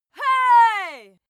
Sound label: female cheer hey; schreie; menschen